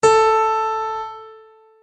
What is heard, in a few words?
A4 piano; notes